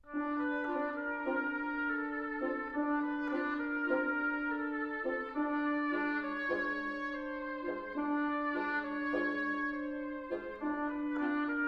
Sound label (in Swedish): Blasarkvintett; Gotlands